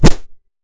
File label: heavy strike; DungeonCrawler